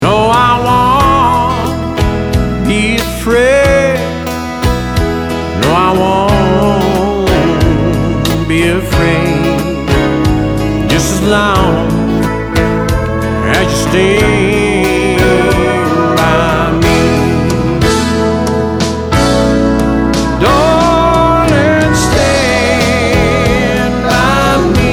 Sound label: ballad n blues